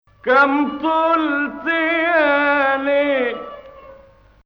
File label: Nahawand